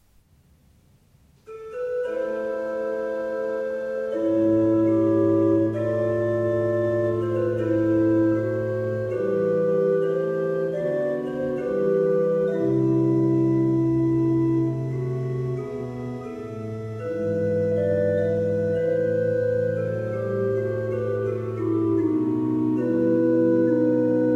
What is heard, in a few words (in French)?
ORGUE MESSE RAMEAUX; domPassionis; Ramos